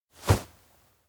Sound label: tap shoulder; awayuki; anemoi